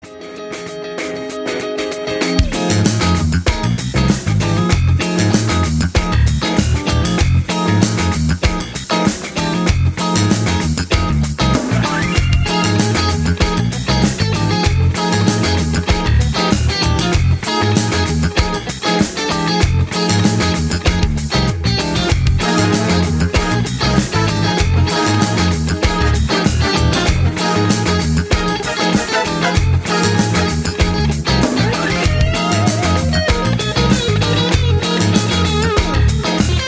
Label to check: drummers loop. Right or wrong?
Right